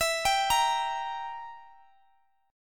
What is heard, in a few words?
E Diminished E down; arpeggiated